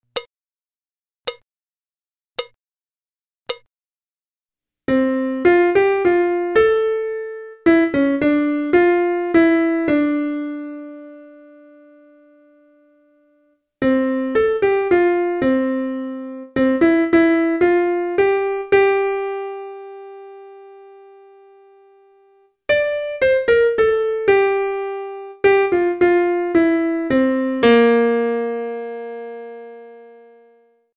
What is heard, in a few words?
Bossa Nova; Jazz Harmonia